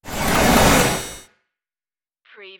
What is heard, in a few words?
futuristic door movement